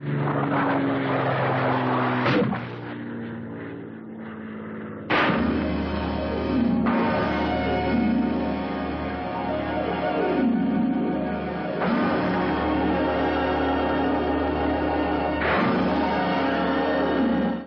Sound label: Dambusters Bomb Test